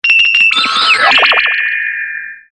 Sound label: Bell Arpeggio